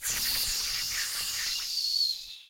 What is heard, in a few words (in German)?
Monster